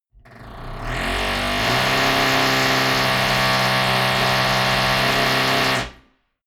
drilling adjacent apartment

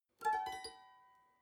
guitar clink stroke up

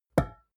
glass door small hit damped soft

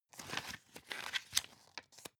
magazine open

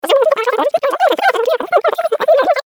funny babbling high pitched

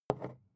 bottle put down light

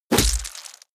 heavy punch gore

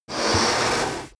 balloon blow

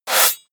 blade draw small

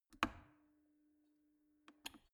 plastic water boiler turn on off soft

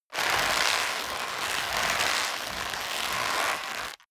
leather creak long hard

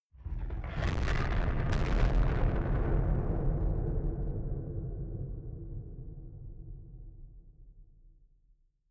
distorted blasts

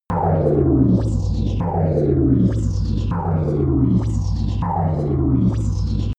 sci-fi machinery

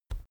keyboard external key push soft

